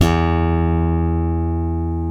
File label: E Bass Slap